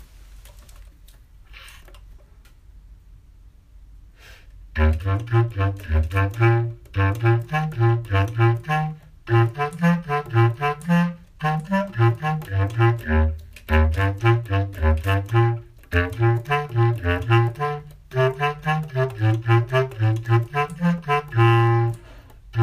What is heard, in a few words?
Bass Clarinet Moderato; 8th grade all state